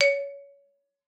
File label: C#4, xylophone; Xsample VOL-4